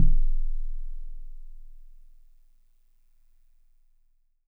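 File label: Celeste DX100 D#1; Pristine Celeste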